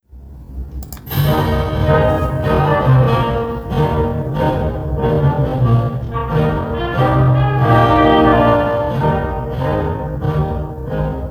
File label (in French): joli tambour slower tempo